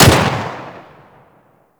Pistol Shot 3D; Kohm

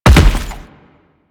Land Heavy Default, Footsteps; Enemies; Warframe; Teshin

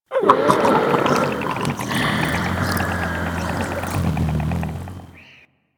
Zombie Breathe; Warframe; Infested; Breathing

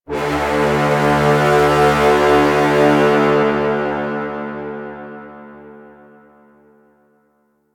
End Of Match Horn; Warframe; Missions; Tenno Ball

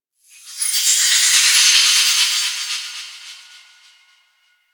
Small Metal Echo; Ambience

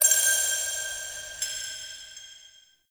Vendor Crystal Coin; Soul Plane

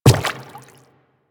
Quadruped Medium Walk Water, footsteps; Warframe; Infested

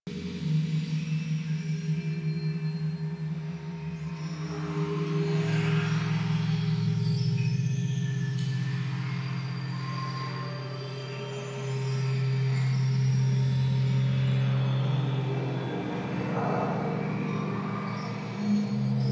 Ambient Loop; Warframe; Venus Open World; Venus Solaris United

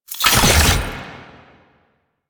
Key Deposit; Warframe; Nineteeen Ninety Nine; Exterminate

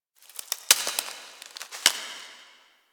Foliage Rustle; Ambience; Warframe; The New War; Quests; Zariman

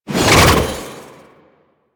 Archwing Prime Sword Short Whoosh; Warframe; Weapons

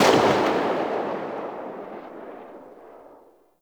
Sniper Rifle Tail; Warframe; Weapons; Corpus

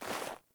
Sandals Walk Snow; Characters; Player

